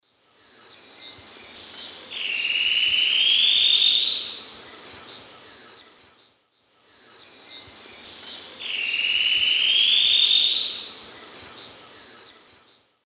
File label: Deconychura stictolaema, song; S. America; Eastern Lowlands